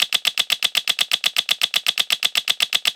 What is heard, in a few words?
freespin increment; Wild Guns